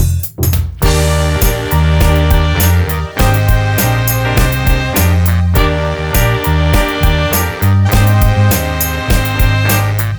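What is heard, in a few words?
No Sax Lead Guitar Included Key Of C Minor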